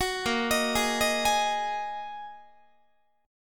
Bb 7th Suspended 4th Sharp 5th Bb Guitar 4A,4E,5A,5D,5G sharp,6C sharp; Bb7sus4#5 arpeggiated; 21,18,18,18,19,19